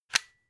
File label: plug unplug single